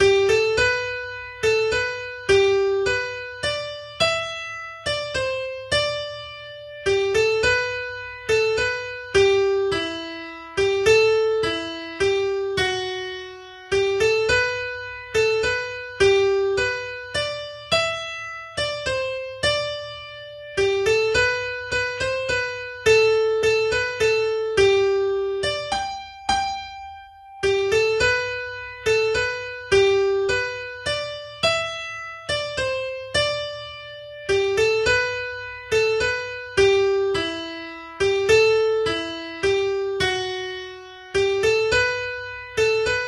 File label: little slow Waltz; Guinness Waltz; AltoSax